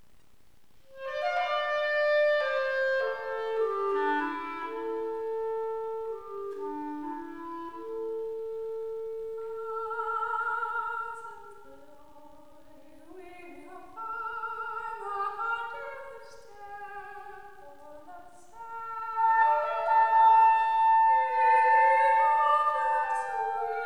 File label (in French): voix clarinette; R.V.Williams